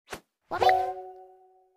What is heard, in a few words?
submit